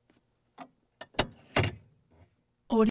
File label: hand brake clicks ebrake, Mercedes Benz 280 SL